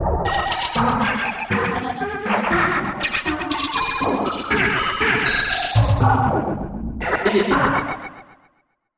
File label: Spooky Voices